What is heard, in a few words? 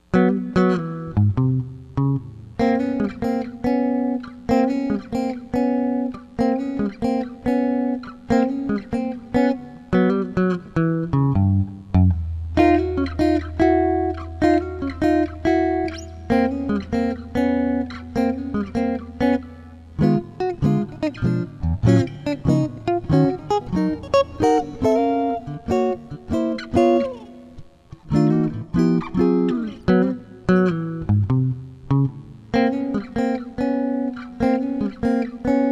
Chord Melody; jazz tunes